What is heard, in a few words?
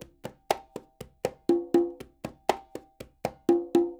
NYC Percussionworks loops; Sampleheads; SF LOOPS 120